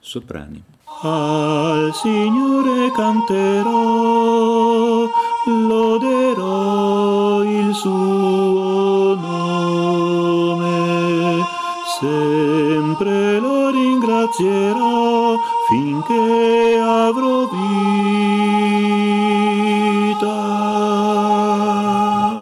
Soprani

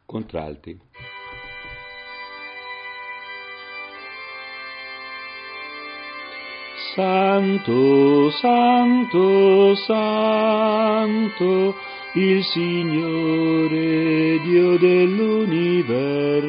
Contralti; Santo

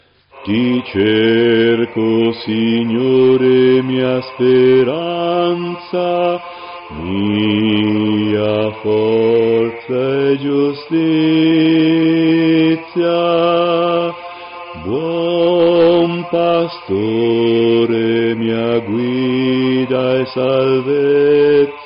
Ti cerco Signore Bassi

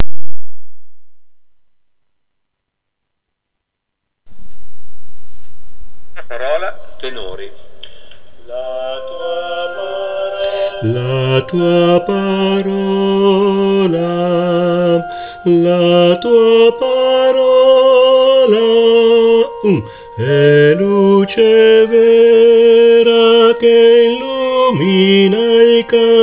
Tenori; ordinazioni presbiteriali

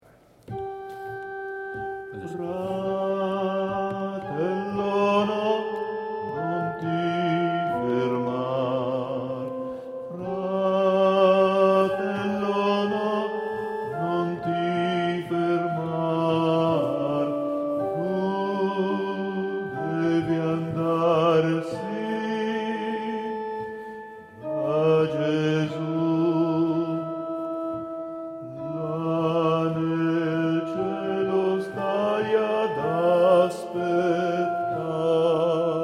Contr

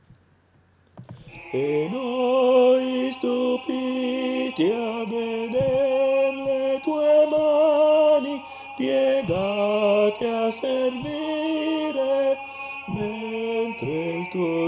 Tenori

